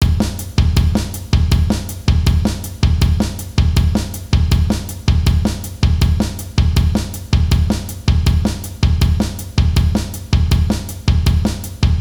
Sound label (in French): double coup grosse caisse, batteur